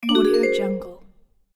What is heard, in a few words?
vibraphone notification message